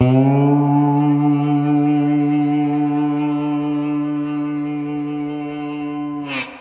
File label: Guitar & Bass; Quantum Leap